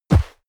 horse step snow

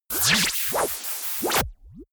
defect computer shut down

